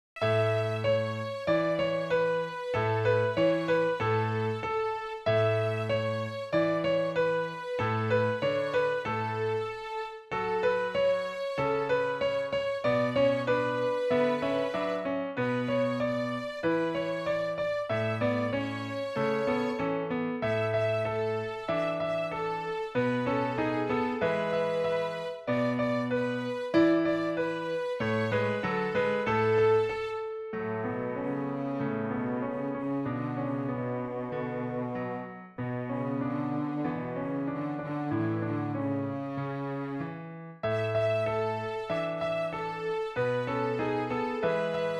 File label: Strings; DI audio (directly converted to a WAV file); a children song for easy strings (violin 1, violin 2, cello and double bass) and accompanying piano